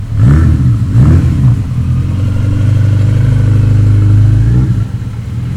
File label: Motorbike idling